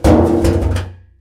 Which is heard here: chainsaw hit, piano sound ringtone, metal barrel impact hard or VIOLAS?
metal barrel impact hard